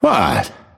deny pain; dota; phantom assassin

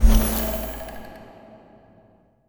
logo rollover; ui; dota

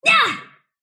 sylph attack short; dota; dark willow

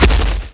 step, footsteps; warlock golem

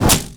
projectile impact; dota; hoodwink

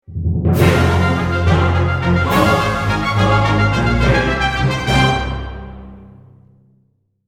greevil mega spawn, stingers, music

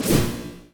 psi blade resonance; templar assassin

